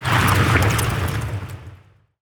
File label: Fire; portal quest android; Mass Destruction Skill2